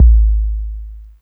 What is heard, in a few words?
BD, Drum & Bass, 150 BPM; Ueberschall; Drum & Bass Resonance